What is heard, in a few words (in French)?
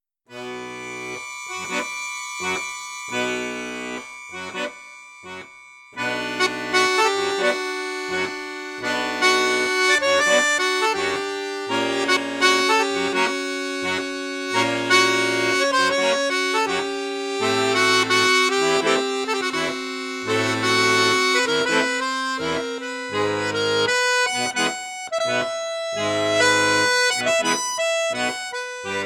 registre basson flute juste, accordeon; cavagnolo orchestre v